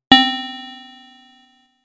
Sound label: Bell C, synthlead